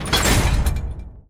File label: Prop Crusher